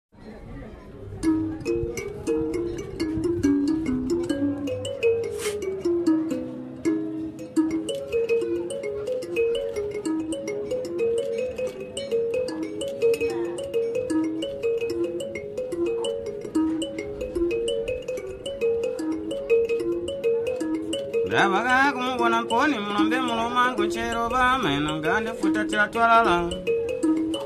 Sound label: mbira; Siachilaba